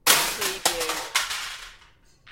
Metal Crash Drop Debris Settle

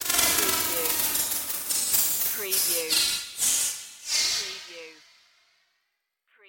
Digital Hit Reverb Slow; Space / Science Fiction (Sci-FI)

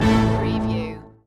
Casino Slot Machine Hold-Button Active

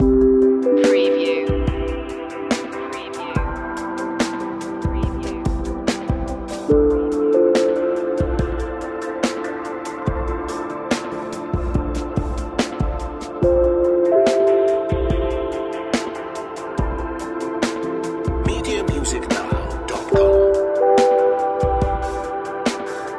emergent lights loop

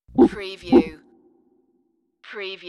Gorilla

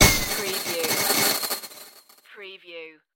electric sizzle impact

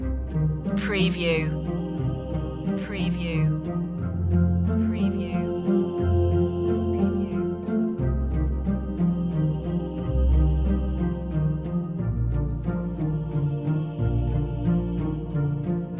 magical fantasy loop

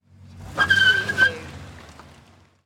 Car Tires Screech

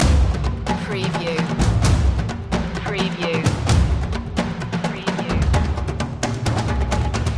storming percussion loop

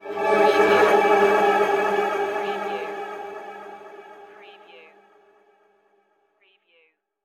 disharmonic ghost flyby

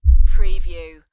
LFE Hit

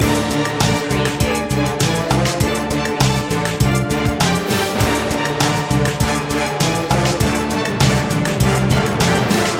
spooky loop; media music now

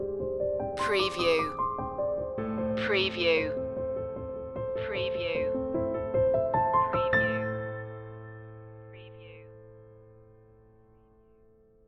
love and heartbeats stinger

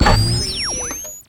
Monitor Turn Off; sound-effects-bible

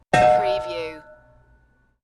Big Old Bell Single

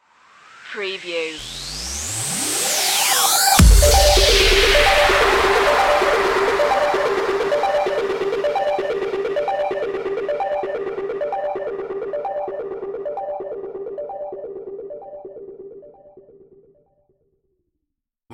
sonarp stinger

